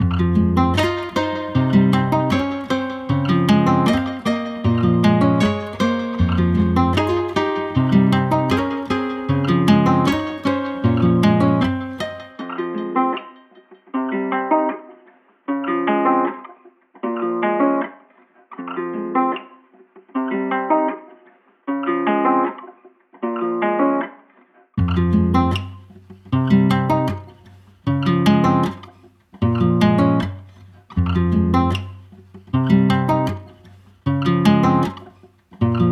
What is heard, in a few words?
155 BPM F Min, Guitar Loops; Voices; Cymatics; Journey Guitar Loops